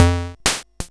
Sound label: Swing, Hing-Hon EK001